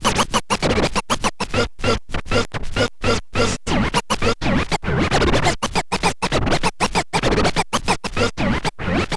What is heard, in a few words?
scratch med, Vinyl Attack scratches mix